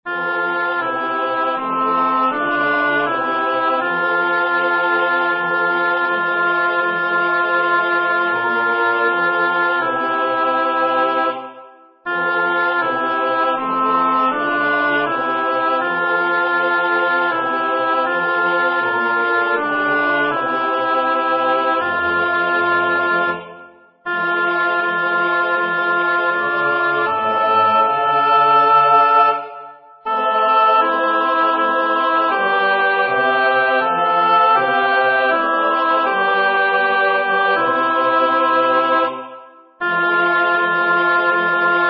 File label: Ave Maria Alto; Arcadelts Ave Maria